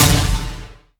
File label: hit; junisaber